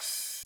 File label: soft hit finish